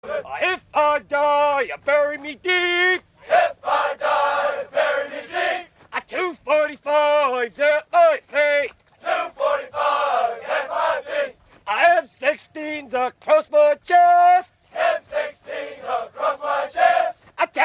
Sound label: military cadence, usmc, if i die bury me deep